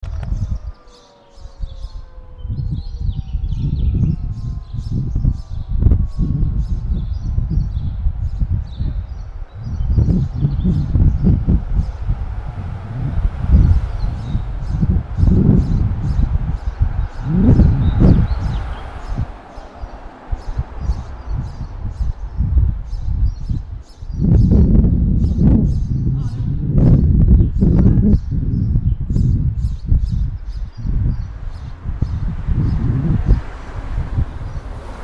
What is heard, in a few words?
SIREN BIRD WIND TRAFFIC; HUDSON